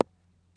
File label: golf ball hit fairway; ProStroke Golf World Tour 2007